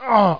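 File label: player pain; abuse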